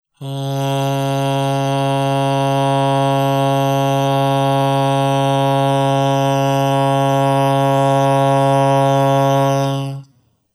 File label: Sustained Ah; Westside Studio